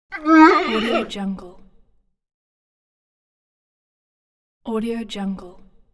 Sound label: monkey titi call; hollywoodedge